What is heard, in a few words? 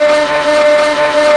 MACHINES